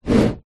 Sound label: plastic tub slide on top of another short; foley